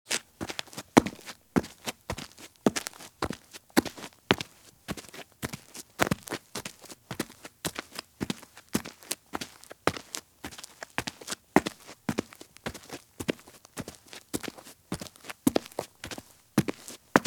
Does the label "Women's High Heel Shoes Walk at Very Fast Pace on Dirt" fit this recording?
Yes